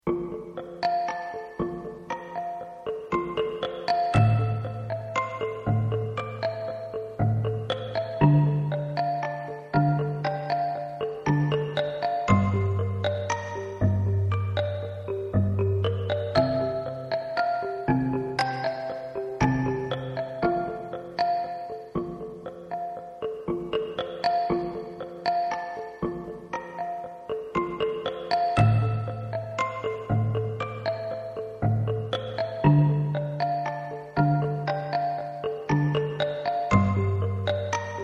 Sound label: supersweet kalimba